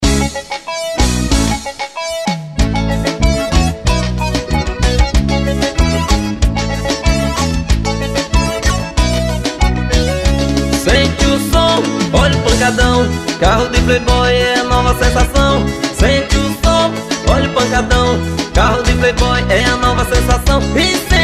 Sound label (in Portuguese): forró